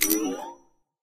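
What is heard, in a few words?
editor connection made; ui; Source Engine; Portal 2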